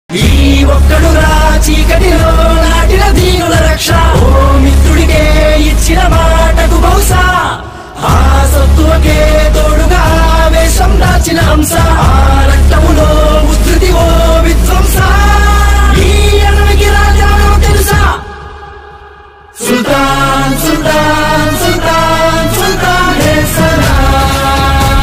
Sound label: telugu ringtone